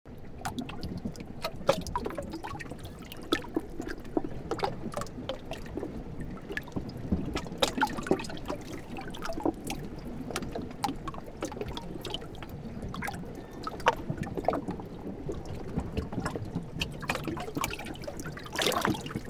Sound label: ice melody; Monaco